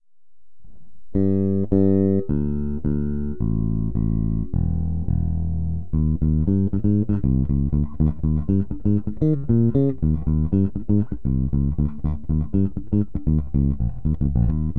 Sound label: FENDER 7250L Nickelplated Steel